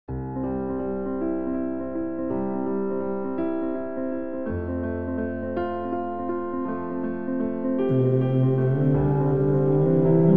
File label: relaxing